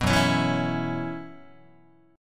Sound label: F sharp 7th Suspended 4th, Guitar; strummed; 2,2,4,2,5,3; F#7sus4 Chord